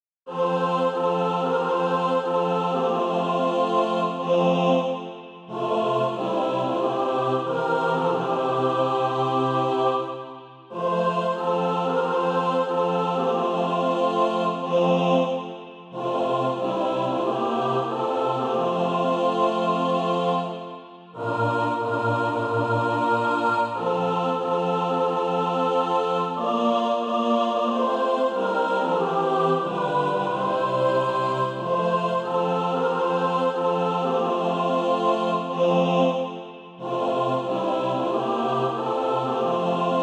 mixed, Sheet music (SATB) including Practise Music